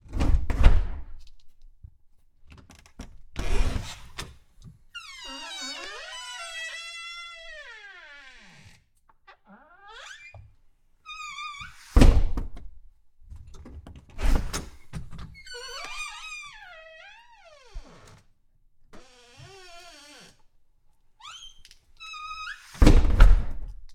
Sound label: door thick open close squeaky; sonniss gdc